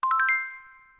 Unlock, phone ui

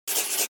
Key Tone; LG SU780 Lollipop 3

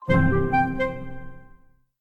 Orch; KONKA SP6

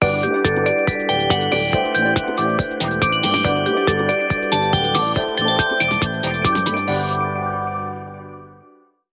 Tune Techno, ringtones; Meizu MX2; Flyme

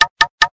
Knock; LG; G-Pro-2-F350K

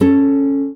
Classic Guitar, notifications

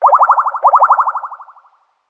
incoming call; Netgear SPH200W Skype WiFi Phone

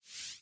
global delete; Oppo A9 2020

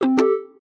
Open, phonetones, LG A200 system

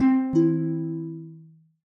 Harp; Samsung Galaxy S24; Calm

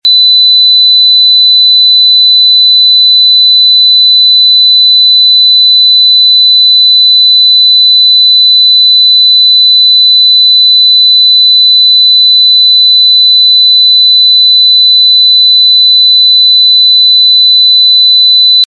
4KHz 0dB LR; LG Wing 5G Verizon

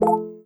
Dock; Nextbit; Robin

